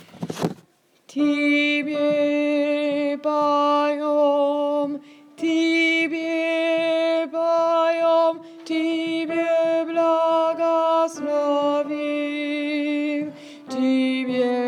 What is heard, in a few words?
tenor